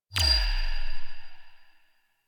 UI Corpus Theme Sweetener; DE; Warframe

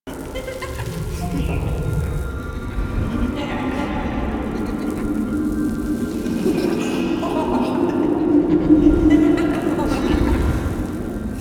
Creepy Laugh Loop; Warframe; Quests; Entrati Labs

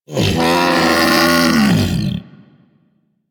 Predasite Vocal Death; Warframe; Hunting Critters

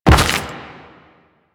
Step Dirt, Footsteps

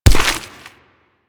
Infested Crawl; Warframe; Crawling

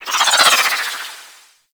Arch Wing Prime Dodge Roll Right; Movement; Warframe; Vehicles

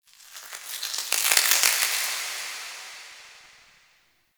Stone Crackle, Crypt Ambience; One Offs; Champion Crypt

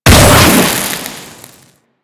Auto Crossbow Fire Charged; Warframe; Tenno

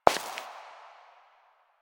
Step Rock; Warframe; Koumei

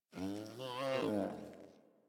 Pet Yawn; Enemies; Warframe; Catbrow; PetShip

